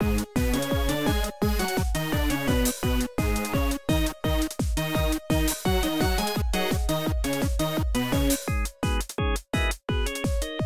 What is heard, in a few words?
Main Menu Loop; rhythmbullet